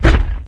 dog footstep walk; npc; source engine; episodic

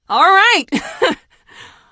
car laughing; Portal 2; Source Engine; video games; npc

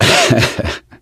laughter, gambler, survivor voice

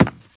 wood, footsteps, infected, walk

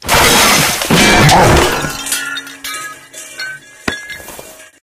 taunt table flip flipping table; video games; source engine; tf

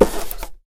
box together, styrofoam

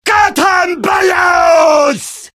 battlecry; demoman; source engine; tf

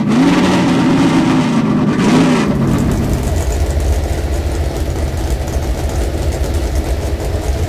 junker throttle off fast loop, vehicles; video games; source engine; ep2

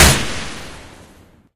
smg fire incendiary; weapons; gunfire; source engine; left4dead2

